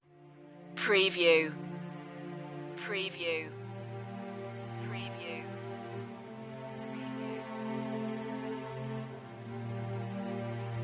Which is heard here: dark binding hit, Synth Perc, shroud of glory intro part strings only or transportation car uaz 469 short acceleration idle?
shroud of glory intro part strings only